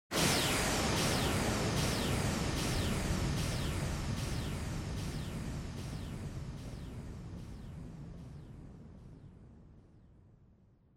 Motion Speed Lines Delay

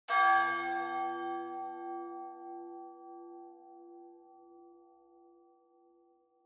Church Bell Far Short

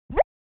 OS Sound Notification

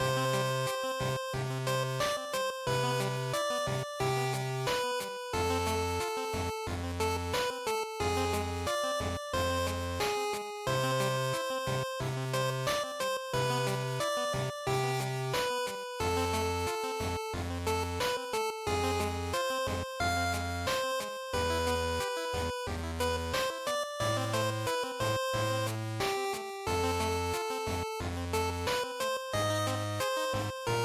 GB Racing ED Loop90

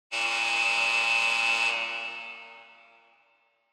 Electromagnetic Buzzer Hall

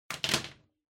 Vacuum Cleaner Cable Noise; 掃除機; ケーブル ノイズ

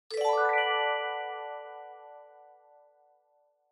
Multi Accent High

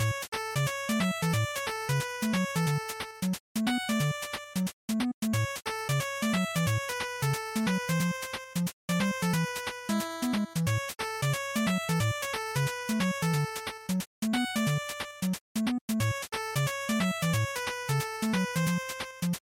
Racing Title Loop, bgm

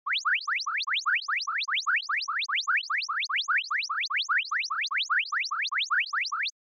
Onoma Panic Slow

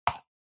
PC Mouse R Hard

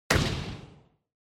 Motion Slam Mid

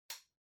Vacuum Cleaner Far Switch

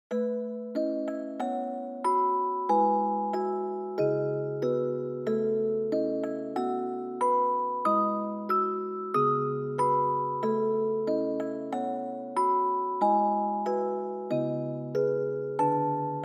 Nostalgia Fast

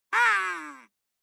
Crow Synthetic

